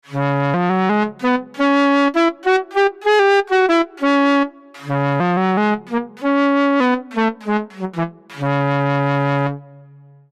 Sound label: Saxophone